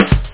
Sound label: drop; wacky